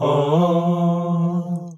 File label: chorus b